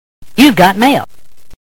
mail

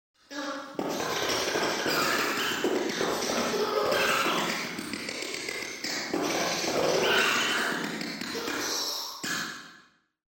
pool

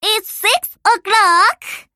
clock

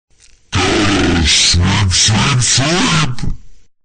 sweep

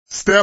step; reversevape

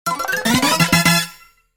victory music

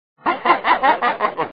boo; vapesquared

